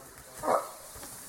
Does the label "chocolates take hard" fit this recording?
No